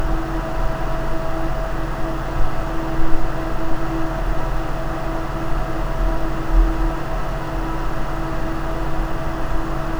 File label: Max RPM